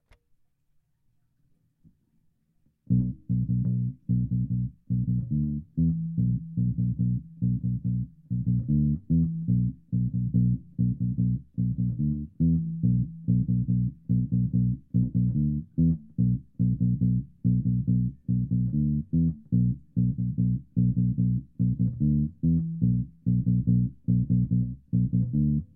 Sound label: bass